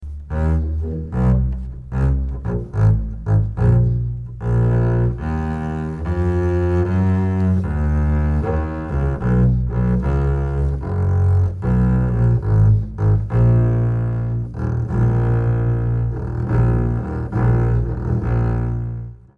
contrabajo

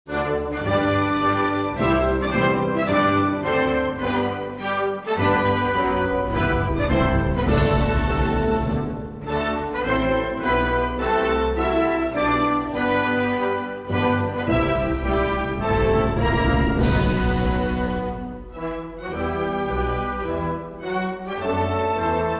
Himno Nacional de República del Congo; Bandera e Himno Nacional de República del Congo

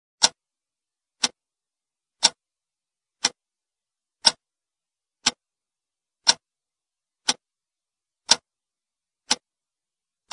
reloj tic tac

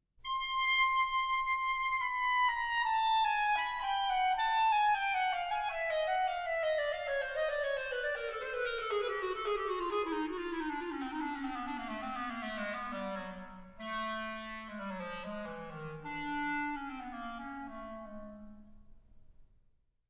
Clarinete

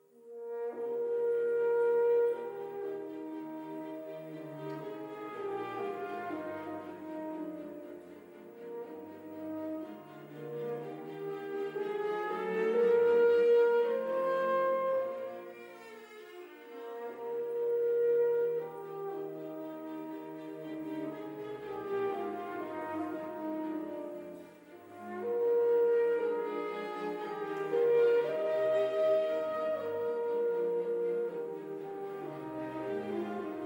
trompa

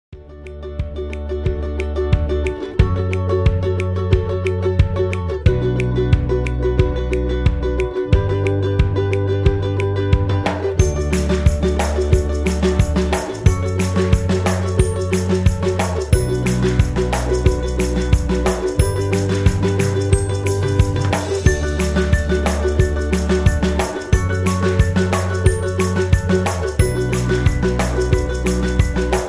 Música Alegre Para Videos Y Presentaciones

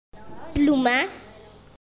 Plumas

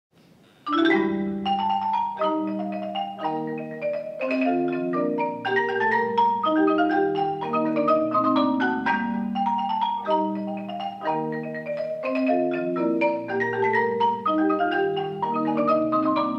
xilo

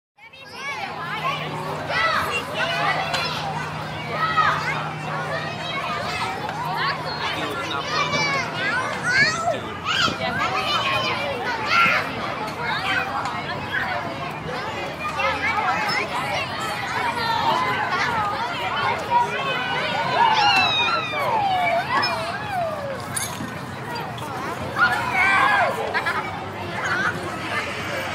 Sonido de niños jugando en el patio de recreo

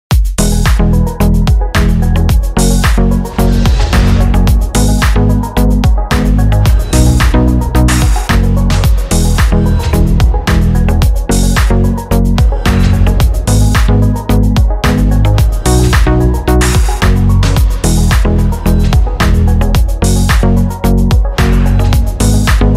Intro cristiano; template sony vegas pro

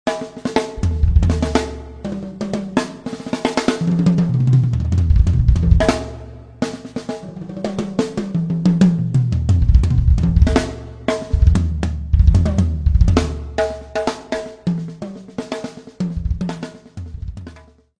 sonido bateria

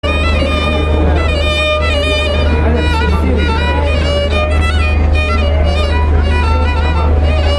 Violín

